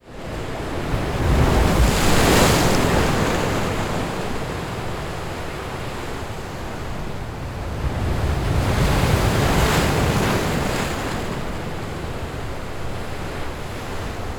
AMBIENCE Huge Waves 2m Away From Impact Point, The Black Sea; Chris Skyes